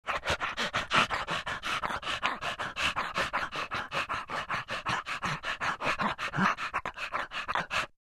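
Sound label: dog voice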